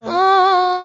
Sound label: "ooh" sung